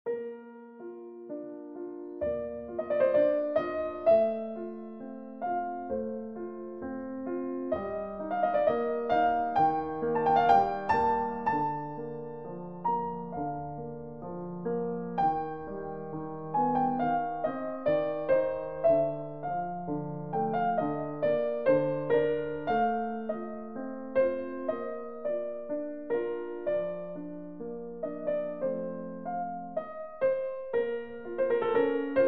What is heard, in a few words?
Piano Sonata in C Major, Andante cantabile